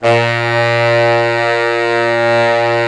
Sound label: brass, Fat Pop Section; Roland LCDP06